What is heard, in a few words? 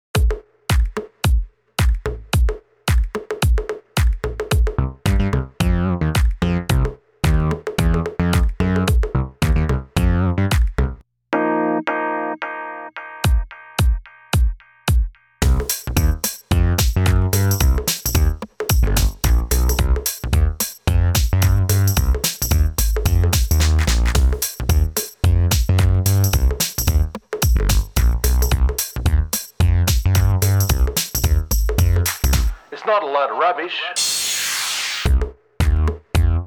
Rubbish Rap